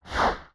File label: Messagebox Out